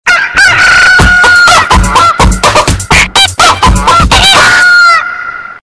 Chicken

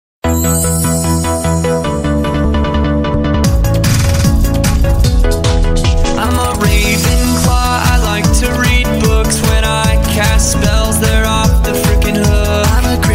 House songs

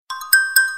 Iphone new sms Tone

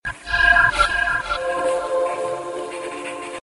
Natures Flute Sms

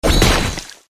Deflect; Katana Zero

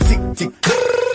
Tik tik trrr

